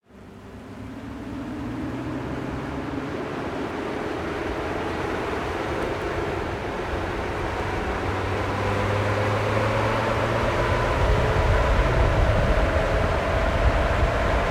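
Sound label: Vehicle Tire Renault R4 GTL Drive and Roll Fast Across Street Speed Bumps Exterior; Wheel Mic; Mono; Justsoundeffects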